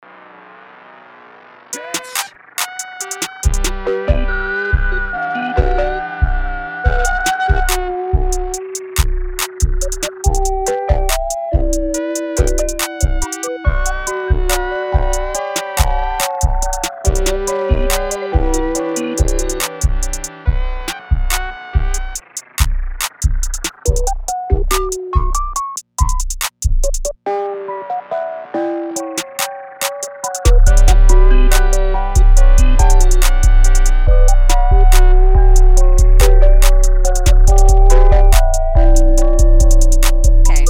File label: beat